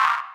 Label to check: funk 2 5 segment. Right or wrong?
Wrong